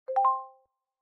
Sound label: in sms, phone